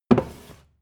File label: book shelve hard